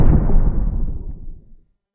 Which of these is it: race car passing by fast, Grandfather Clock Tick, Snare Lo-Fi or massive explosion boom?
massive explosion boom